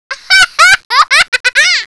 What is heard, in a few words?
laugh